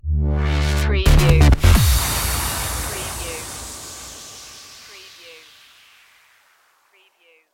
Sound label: acid funk sandwich stinger